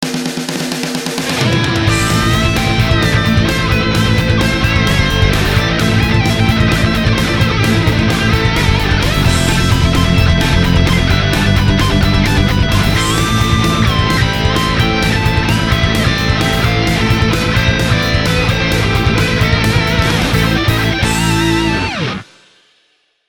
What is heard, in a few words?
Result rock